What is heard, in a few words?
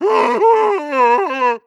idle moan dry, zombie vox; creatures